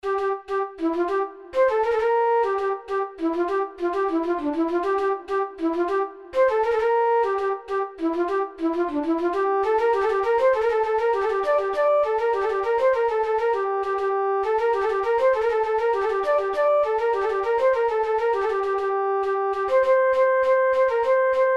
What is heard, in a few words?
Flut